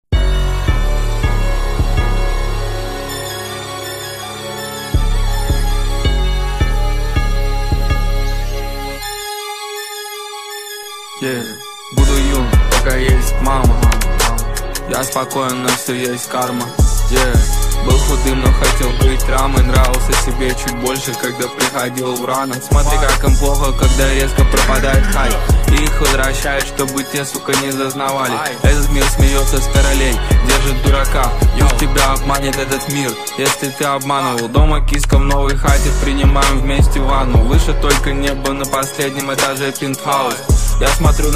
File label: mashup